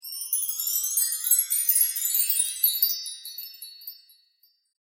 u chimes

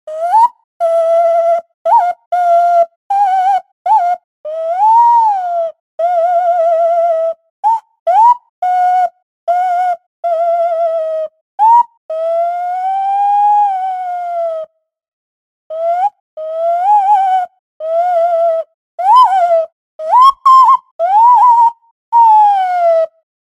funny bird sounds; Cartoon bird talking sounds - a collection of sounds to chop up to make talking sounds for cartoons, puppets, etc

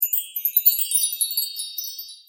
chimes short, windchimes

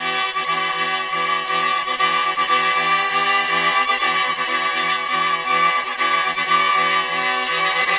drama 120 violins frantic

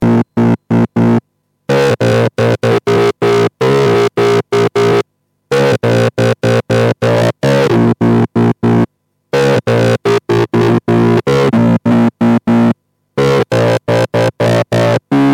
grungee synth

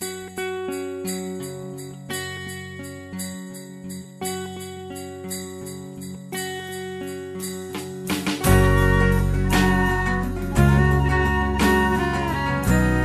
electric december music

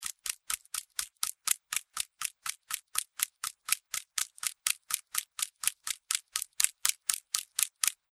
film clack; clicking clacking flicking plastic slapping flipping jammed gun misfire misfiring

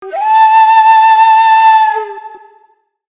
whistle a

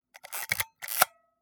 old camera winding sound; Old SLR film camera winding; chemical camera, analogue camera, film advance sound, mirror slap sound, manual winding, mechanical shutte

